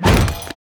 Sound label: Impact; Ed Edd n Eddy; Adromulus Pack